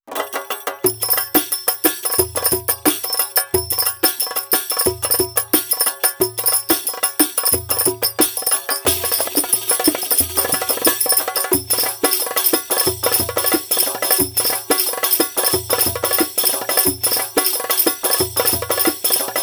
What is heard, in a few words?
darbuka show; by burak sound bank; darbuka ritim paketlerii vol 1